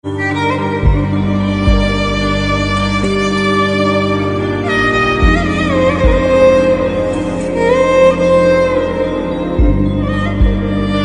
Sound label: teflaane atshaan ringtone